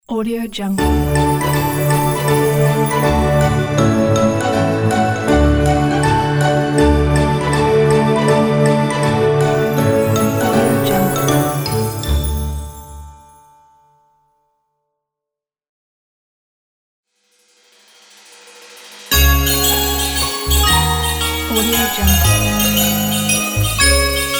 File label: merry christmas logo pack